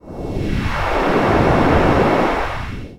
missile; starfighter

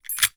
key pickup; breakhack

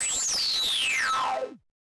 beam Laser; starfighter

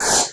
burst; breakhack

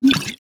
bottle; breakhack

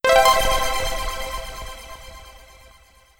magic pickup; breakhack

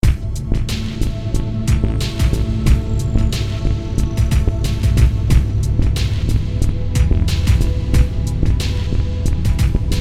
bog creatures on the move looping; breakhack

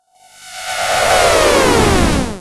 hyper Space; starfighter

